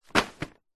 leisure game mat plastic sheet folded drop carpet floor; twister